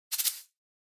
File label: Shaker Roll Short